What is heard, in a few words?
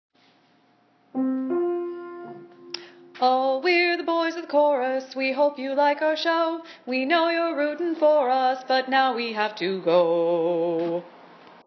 Boys of Chorus